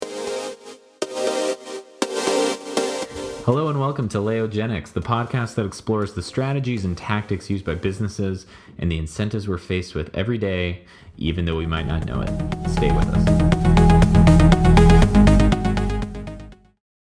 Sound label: Intro Techno